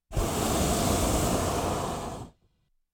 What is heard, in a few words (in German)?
heißluftballon - 1 kurzer brennstoß; Sport